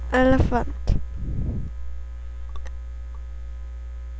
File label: elephant